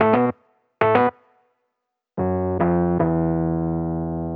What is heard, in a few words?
jazz keys ElPiano 110 B-line n Riff B